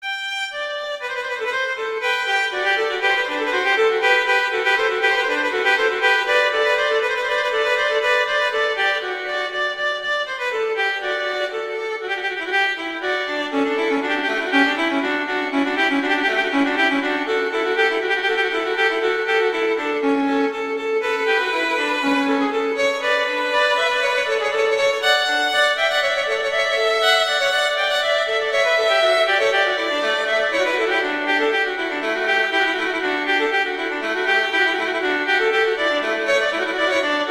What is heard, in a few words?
Allegro; Telemann; Canonic; Sonata 2